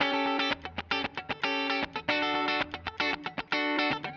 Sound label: Clean Guitar; Stringed Instruments